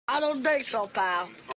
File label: Beet